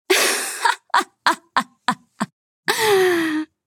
laugh

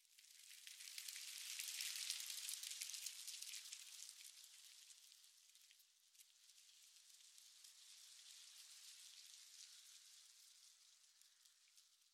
Leaf Rustle

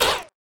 Monster Hurt

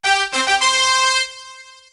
start; Fish Flingers

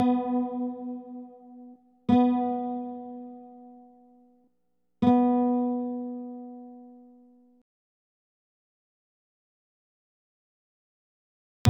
Playing Chords; betrayal at krondor

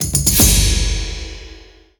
Gain level; Puzzle Chronicles; PS3; PSP; X360

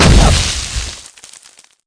Sand Hit

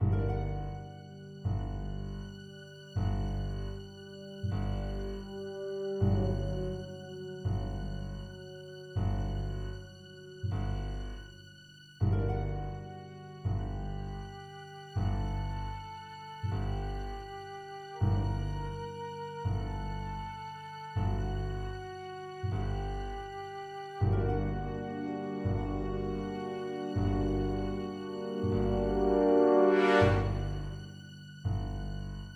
Nuclear Missle Puzzle Music

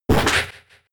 Low Kick

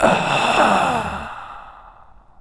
Gas Die

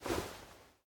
Cloth Crouch Down

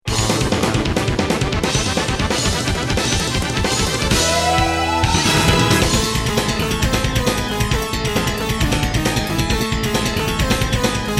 Boss Alt BGM, PC Engine CD-ROM; r-type complete cd turbografx 16; Irem

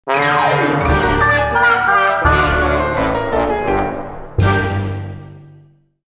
Lose Stinger; Shrek the Third; PS2

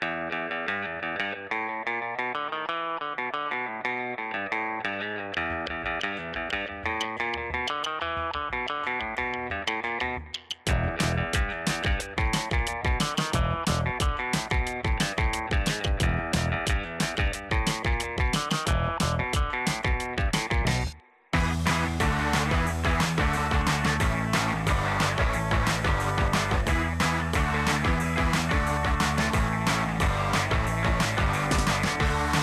Futuristic 60's Rhythm; Disney Infinity

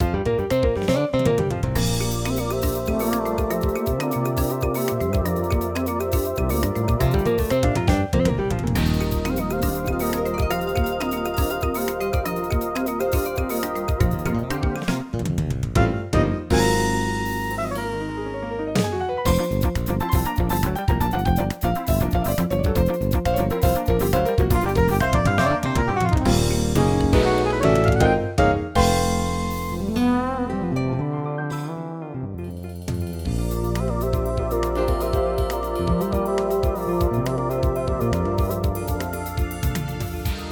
Roland SC-88 Pro; Flourish

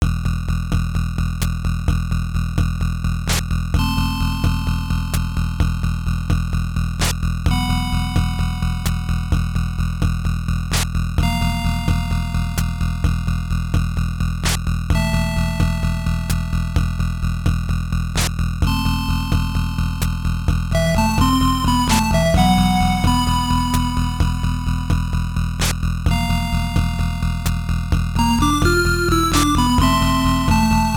BGM

